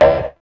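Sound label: synth effect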